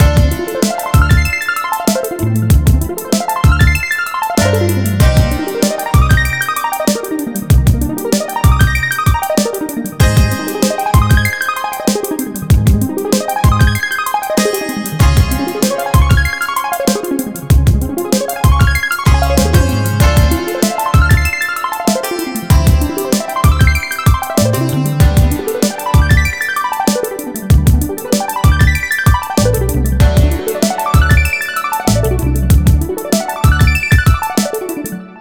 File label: With Arps